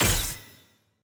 attack heavy; bloom minigame

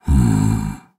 Nonverbal Grateful; Ornn

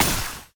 Enemy Attack Puddle Hit; bloom minigame

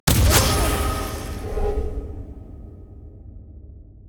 ui button click; spirit blossom

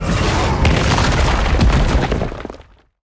Enemy Attack Slam Cast Rock; bloom minigame

